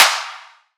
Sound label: Riddim Clap